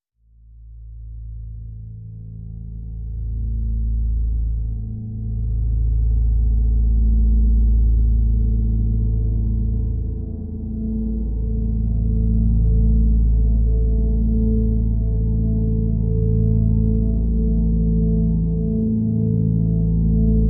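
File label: drone A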